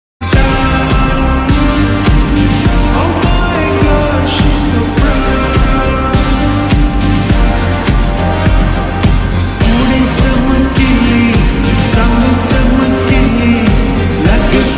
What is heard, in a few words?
slowed hindi ringtone